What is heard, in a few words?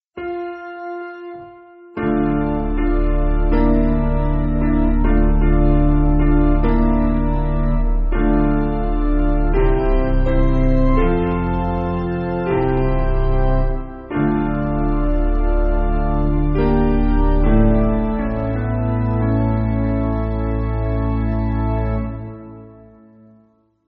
Piano; Taize